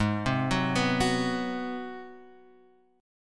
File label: G sharp 7th Sharp 9th, Guitar, Open F; arpeggiated; x,3,0,1,2,1; JGuitar